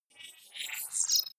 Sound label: wobble swell robot; sweeps-swells